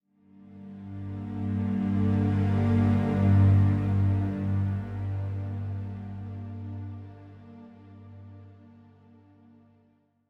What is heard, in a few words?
85bpm; Legends of Pegasus; windows gamerip 2012